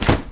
bird flap

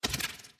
teratoma bounce small

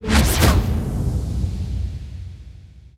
trapdoor jump

